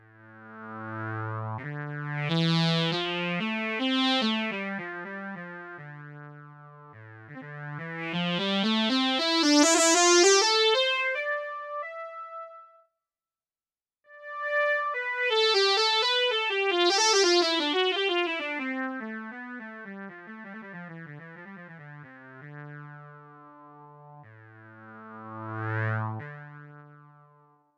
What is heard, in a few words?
Yamaha YDS-150 Resonance Lead